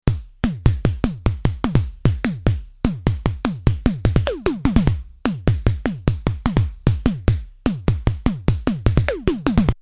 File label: v-synth e tom